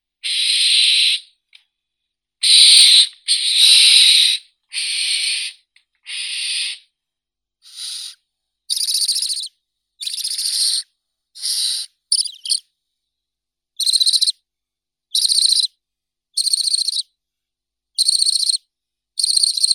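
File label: barn owl tyto alba chicks; sipuha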